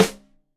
Rim Shot Stereo, drums; Deeply Sampled Drums; SMDrum

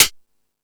Thundadome, E-MU Pro-Cussion

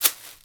Household Shaker, household rhythm samples Hits

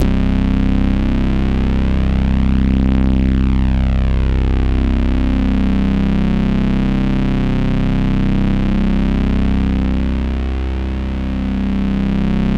C1, Synth Line; 90 s Sample CDs; NiceBeats; SynthLine VOL-14

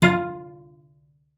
F4, CELLO FINGER; Xsample VOL-5

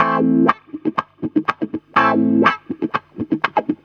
GTR, Roots Disco; USB Soundscan vol.32; AKAI

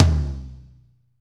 tom, Pop Country, Wet; Northstar; Drumscapes Roland

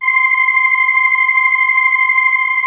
CHOIR; Greytsounds; Scott Peers Sound Engineering

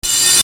Hat, EuroDance

